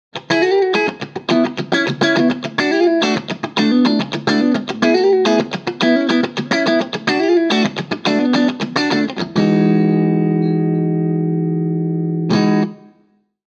Crush 20RT Funk Reverb

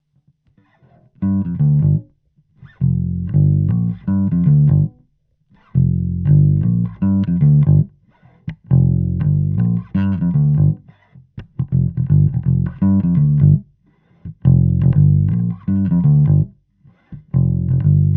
Crush Clean Funk J

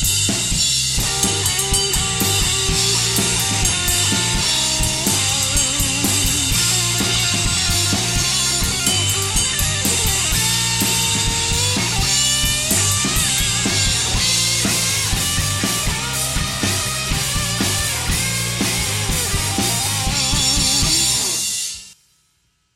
Outro Solo

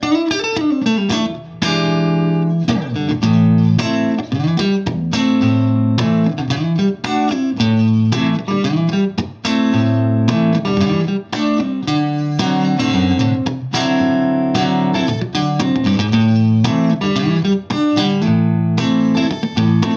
Bed RB 4824

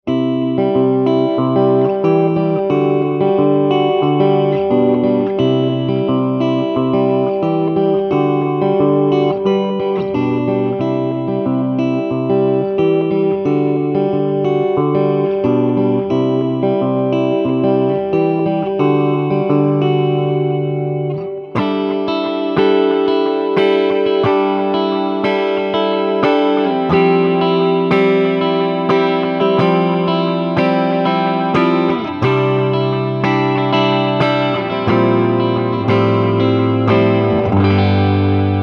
Rocker 15 Les Paul Clean

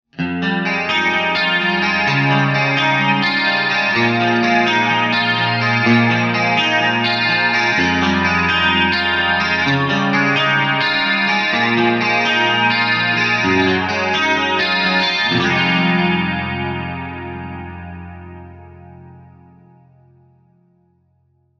Micro Dark Shimmering Clean Chorus

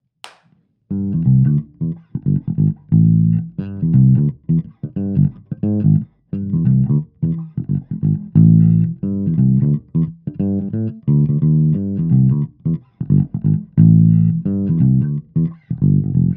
Crush Clean Funk J

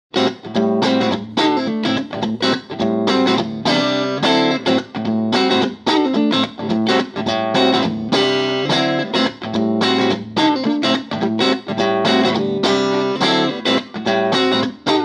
Crush Clean Funk

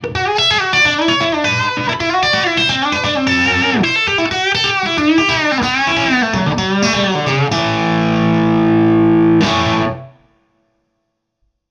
Country Lick

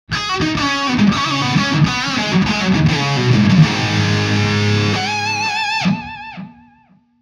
Micro Dark High Gain Lead With Delay

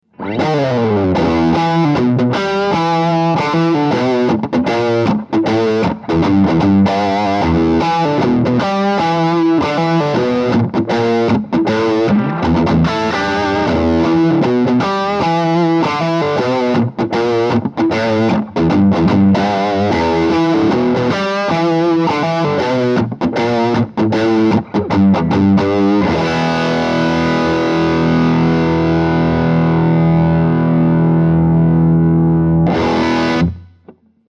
Rocker 15 Dirty; Orange

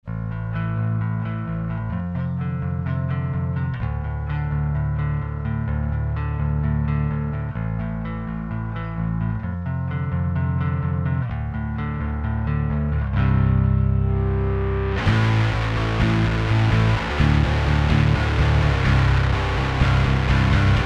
Bass Exp Fade; Bass Butler

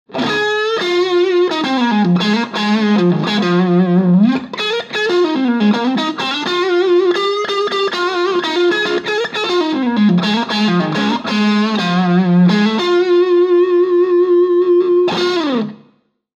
Crush Cranked Lead Slight Reverb

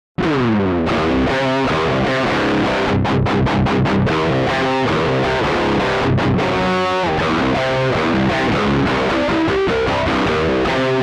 Cab Sim Headphone

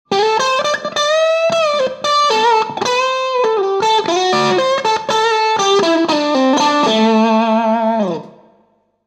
Crush Crunch Reverb

